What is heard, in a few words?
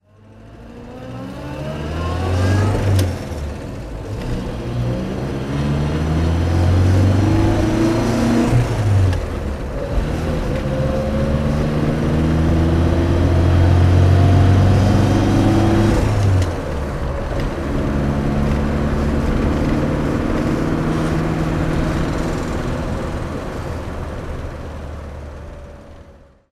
ford anglia driving